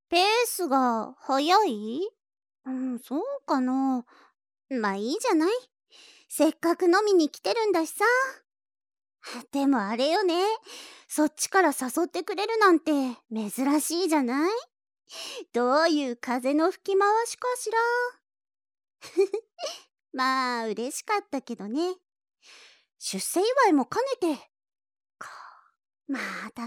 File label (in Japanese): H音のみ